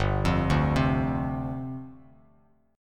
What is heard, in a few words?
G 7th Suspended 4th G down Bass Standard; G7sus4 arpeggiated